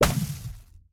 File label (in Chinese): 导弹命中声; 潜影贝